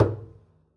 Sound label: pizz noise high thump, double bass; dsmolken; noises